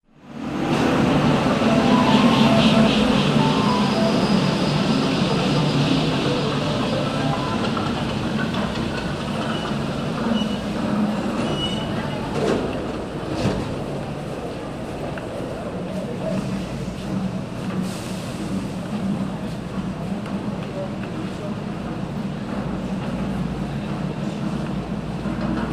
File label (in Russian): zvuk vokzala vozle platform